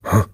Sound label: huh; zacharias